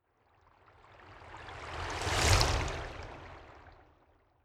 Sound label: whoosh slow